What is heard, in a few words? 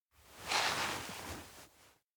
beach shift; aino